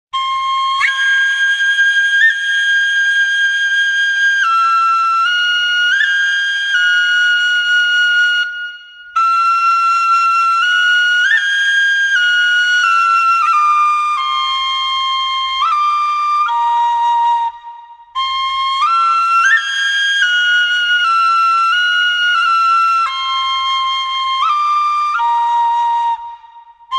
Celtic high, wind instruments; wind instruments from around the World; Andy Findon; Ancient Treasures, exploring wind instruments from around the World

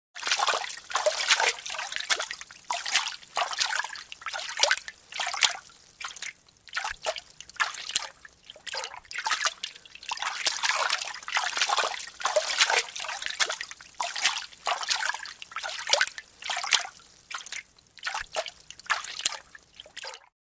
Wade Through Water Hands Splash in & Out of Water

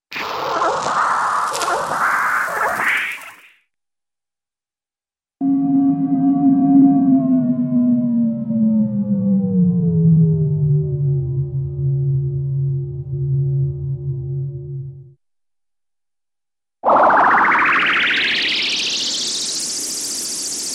Electrical Crackling Ascending Synth Tones (Ufo); Hollywood Edge Cartoon Trax

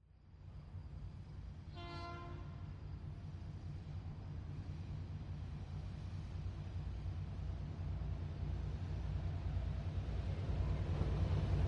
Short Freight Train Pass; SOUND EFFECTS1

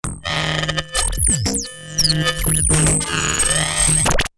Ear Violation Noise; Best Of N2O Library; Stabs & Bursts

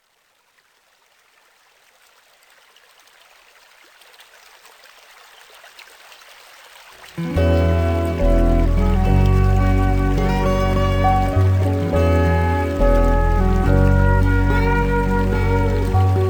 Spruce Woods, Forest Guitar; Dan Gibson

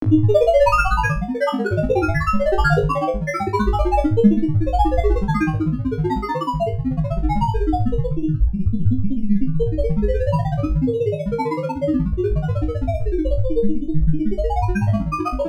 tones

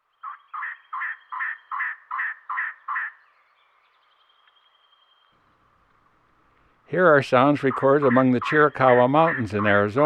Elegant Trogan; Western North America; Dan Gibson; Wildlife Identification By Sound

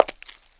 pavement, footsteps; 1000fx

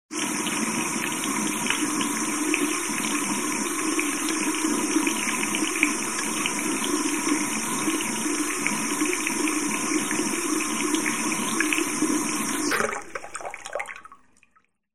Water running into sink; SOUND EFFECTS1

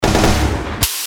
Drum Rocket Transition; Best Of N2O Library; Breakers & Transitioners

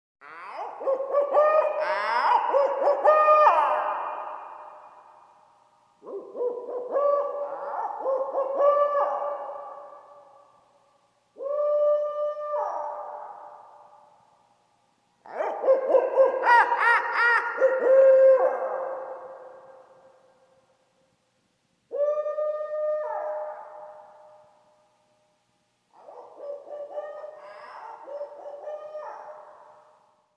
Hoot Owl; SOUND EFFECTS1

